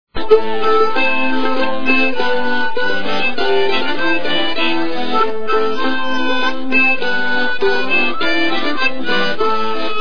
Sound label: scotisch